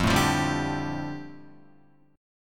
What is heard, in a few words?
F 6th Add 9th F down Guitar, chord